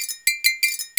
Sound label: RYTHM C5; Big Fish Audio; Synth City